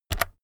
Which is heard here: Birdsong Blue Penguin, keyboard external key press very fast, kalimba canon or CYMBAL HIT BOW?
keyboard external key press very fast